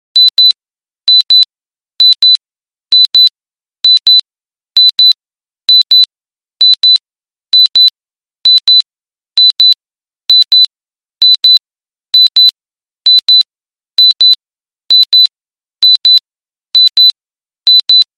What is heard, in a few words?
digital watch alarm